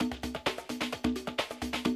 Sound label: 130, Classic DrumLoops